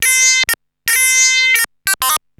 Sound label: Arp E Line, ARP Odyssey; musicradar-synth-samples